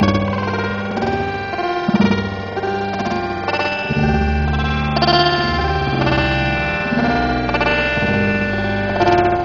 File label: chromatic